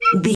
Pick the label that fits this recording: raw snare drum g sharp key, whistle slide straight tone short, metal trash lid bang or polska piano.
whistle slide straight tone short